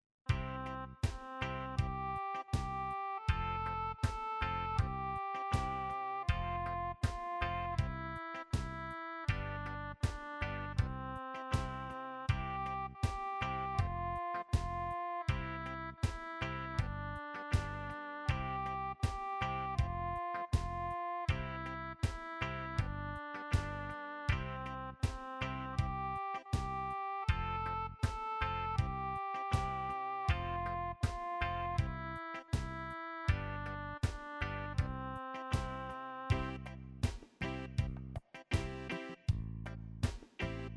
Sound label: twinkle